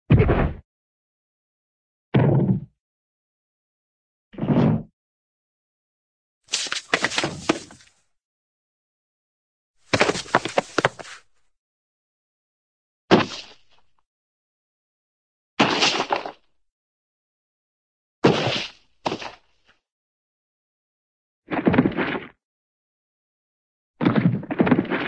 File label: fall, body heavy body fall, human; cartoon